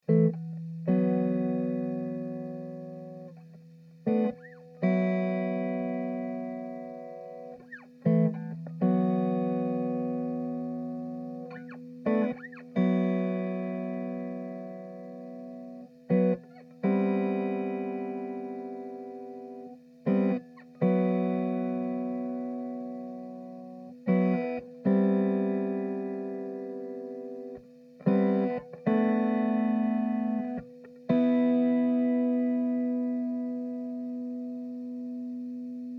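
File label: Em 120 guitar jazzy riffs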